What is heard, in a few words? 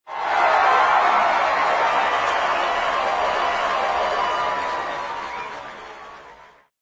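big crowd shouts applause; Bolshaya tolpa kriki aplodismenty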